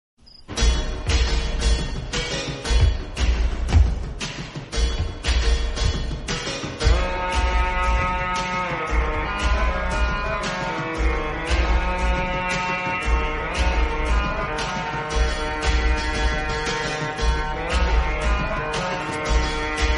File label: villain, bgm; kaithi; anbu